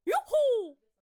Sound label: Hay Baler Attack Vocals